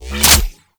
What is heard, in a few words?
Reload, Weapons; Warframe; Corpus; Tenet Quanta